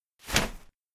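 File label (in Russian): чистый звук включения прожектора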